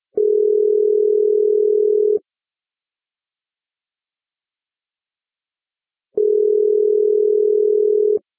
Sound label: ringback